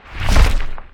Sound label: Dragon Wing Flap Big; SyrinScape; Hunting horror battle